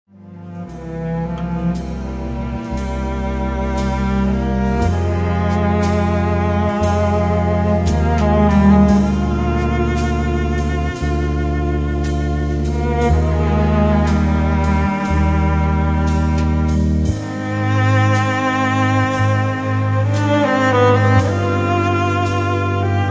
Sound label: cello; Chemins de Repentance; La Partition de MA2